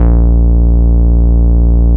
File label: A#2, Bass; E-MU Producer Series Vol. 6 – Analogia Project (CD 1); Boom da Bass 1